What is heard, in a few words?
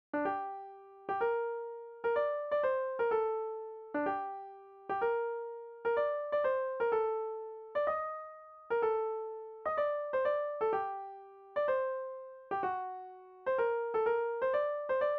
melody